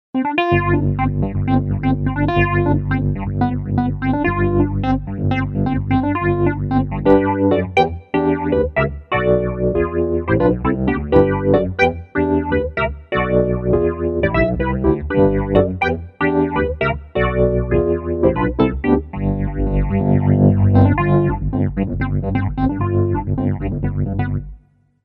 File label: nord stage